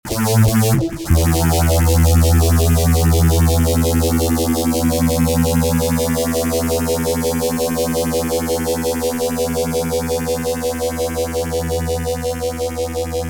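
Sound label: fuzz, Nady phaser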